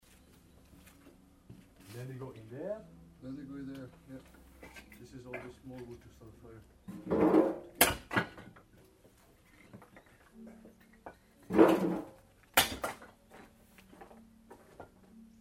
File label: wood chopping; fieldrecs